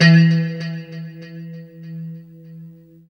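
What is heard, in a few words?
Low E E 3; Guitars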